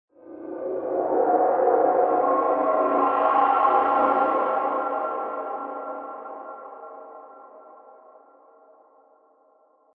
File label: Deep Abyss, stingers